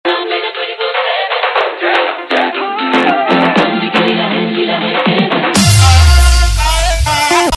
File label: flute tone ringtone; krishna